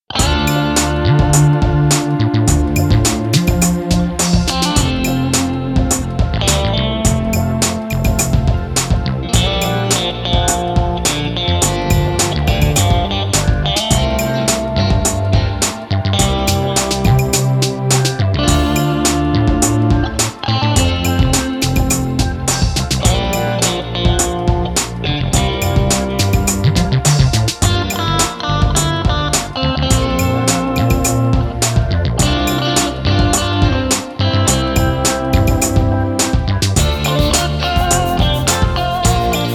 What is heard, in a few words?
Tusker Rock